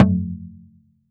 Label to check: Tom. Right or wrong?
Right